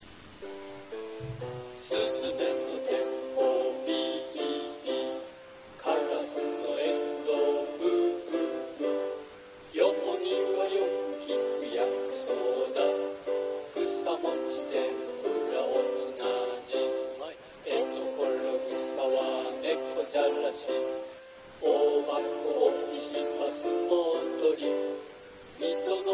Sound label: taiko